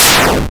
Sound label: enemy die; roomforchange